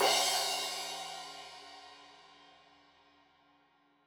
Crash 15in L edge, Cymbals Stereo

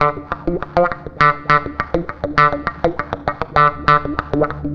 WAH, WAH WAH GTR; USB Soundscan vol.31; Miro s Grooves; AKAI

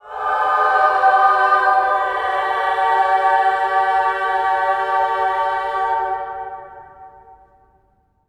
amen; Spectrasonics; Symphony of Voices; BC AMENS

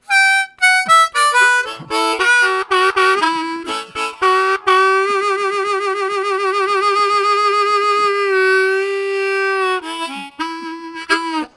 Vibro, Harmonica Riffs; Zero G - Harmonica

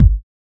kick dbloh 909; neuro; Sinistarr; Drums

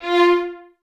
Violins Detache F 4; Miroslav Vitous; 11 Violins

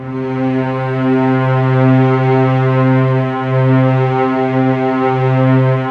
WARM VIOL, JV-1080 ORCHESTR; ORCHESTR-EXP; Syntec - Wall of Sounds VOL-2

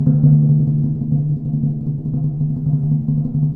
DRM, Orchestral Perc; Roland LCDP03

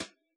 brush tap; AnlgKit1

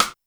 snare, Roland R-70

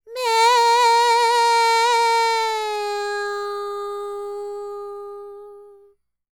World Voices, INDIA; ILIO; Vocal Planet

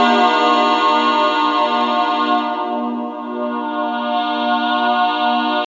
Chandler Guy F, Pads; Zero-G; Total Drum Bass

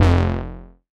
bass F1, ARP Odyssey

